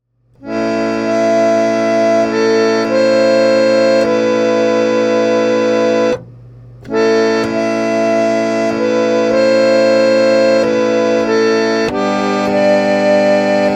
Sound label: toy organ; I bought a toy organ at the thrift store and recorded this fun little test on it.